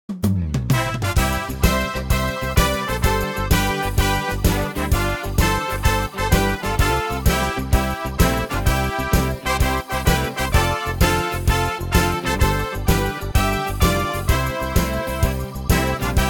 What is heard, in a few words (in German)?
Klingelton; Havelich